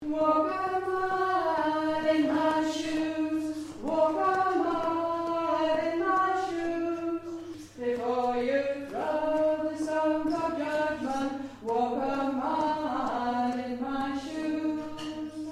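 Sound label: soprano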